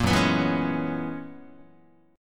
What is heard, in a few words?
Gb 11th, Guitar; Gb11 strummed; JGuitar